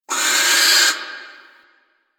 Owl Critters Vocal Alerted; Warframe; Ambience; Duviri

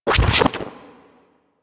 Beam Impact Generic; Surface

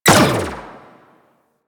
Energy Rifle Fire; Warframe; Weapons

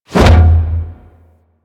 Pilot Breakout Hit Two; Warframe; Amalgam Carrus

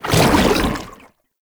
Goo Layer, Footsteps; Enemies; Foley; Warframe; Lasrian Soldiers; Lasrian Chem Strike Nox

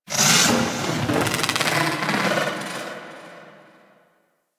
Sentient Lair Exterior Creature; Ambience; Creatures; Misc Creatures; Warframe

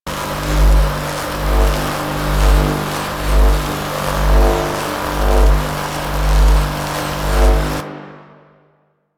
perimeter forcefield loop; Ambience; Props; Loops; Warframe; Eidolon Plains

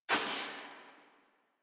Player Dash; Warframe; Mini Games; Caliber Chicks Minigame

